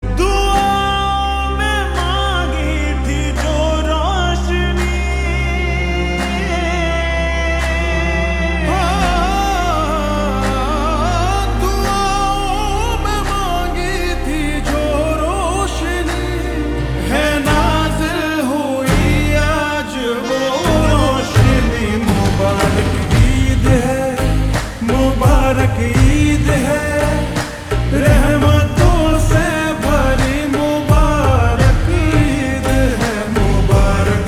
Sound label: Ringtone Slowed Reverb